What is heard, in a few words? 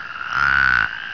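plains spadefoot call; texas nature trackers; amphibian watch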